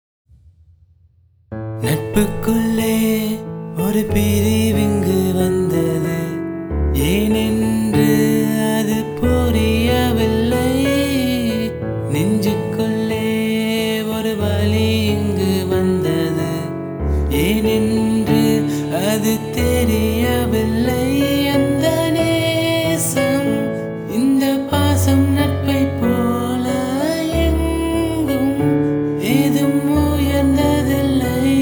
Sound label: Unplugged Cover